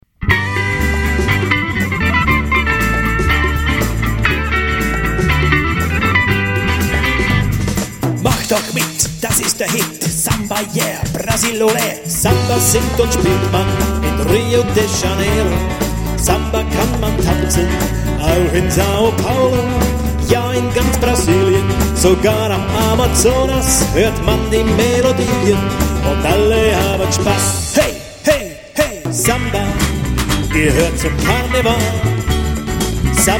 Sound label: Samba Voc